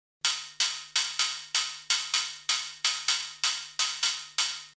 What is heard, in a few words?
hihat